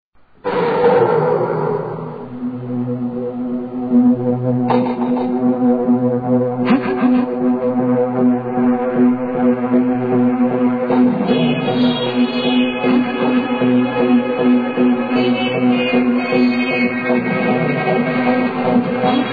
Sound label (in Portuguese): ritmo